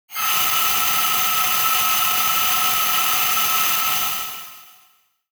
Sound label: Screamy Screetchy; soundscrate